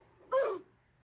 ugh kid

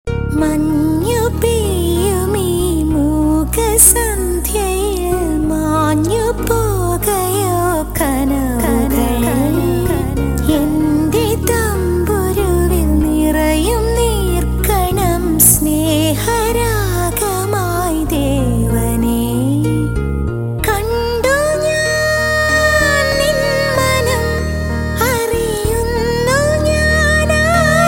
Malayalam Love

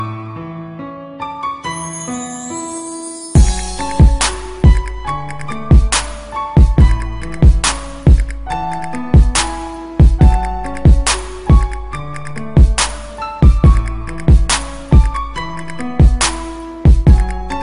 Love Piano Beat

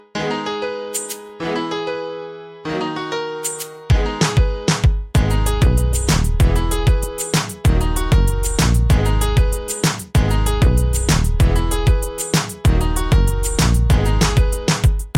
Piano Bit

